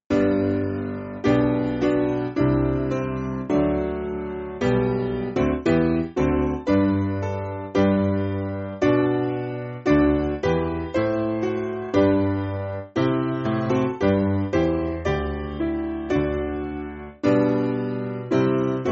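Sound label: Piano, Music Backing Files for Churches; Father Most Holy; Angers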